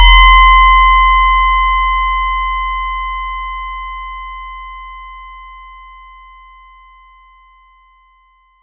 FX RINGR; ModelCycles

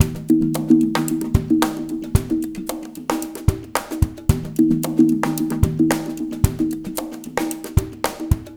UK MIX; Spectrasonics; Liquid Grooves 1 & 2

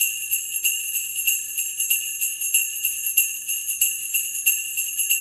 BELLS; East West; Funky Ass Loops

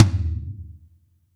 TOM G3 LOU R; Zero-G; Chemical Beats

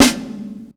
Snare, breakcore Drum Hits

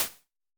Snare Hats, Vermona Mono15 Drums

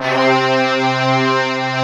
BRASS TAB C2; VFX-SD #6

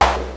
Snare Drum, Cwejman S1 MK2

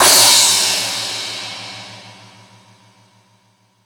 Hip Hop, Drum Machines E-MU Pro-Cussion

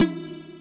mod tom, Mod Drums; neuro; Sinistarr

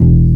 BASS, AC BASS, AKAI S6000; AKAI S6000 CD-ROM - Volume 1

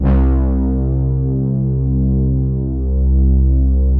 OBERH; PADS; Vintage Voltage

